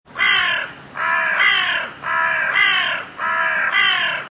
corneilles

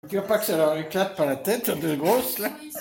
eclat de rire

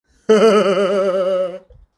rire

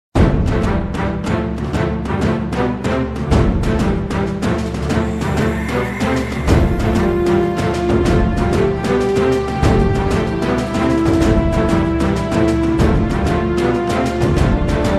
musique; koh lanta

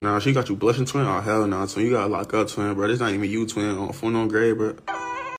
basse

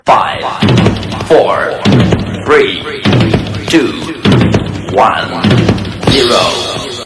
fete foraine jingle

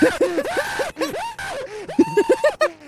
crie virile

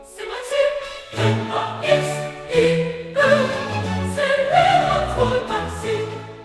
chant

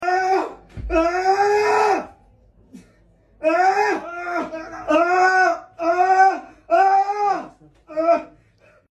cri aaaaa

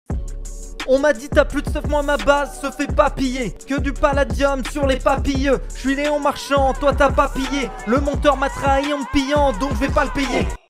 rap

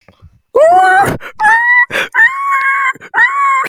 crie humide

